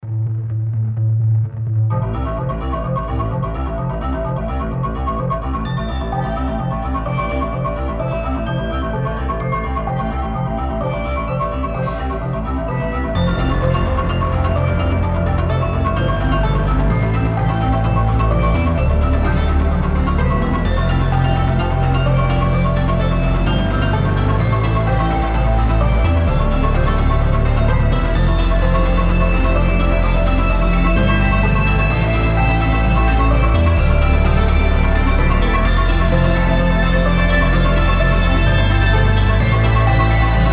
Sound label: chromaphone; chromaphone 3 demo duality; niall mccallum